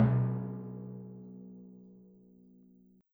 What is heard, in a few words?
Timpani, Orchestral Percussion One Shots